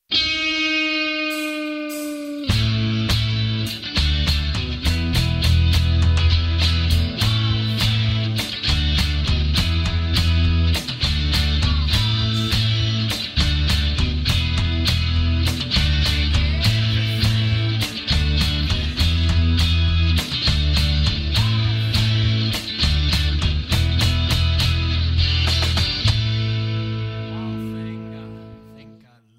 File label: ringtone